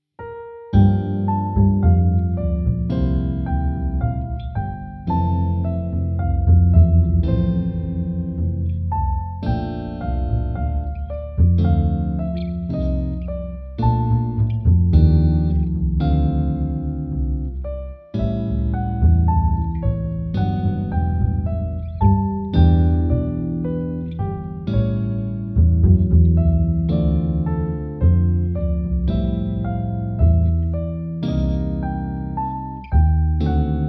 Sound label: Jungle Playback